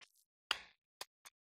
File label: 3 tones